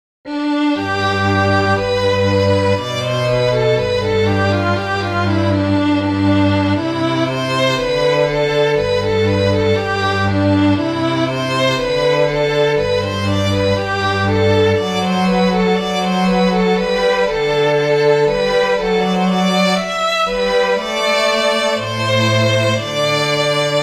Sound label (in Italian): in ch. di violino; Sveggeve putte care